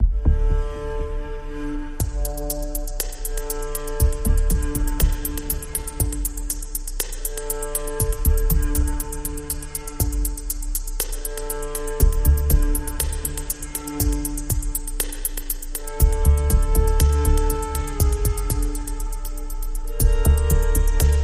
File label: Lamenting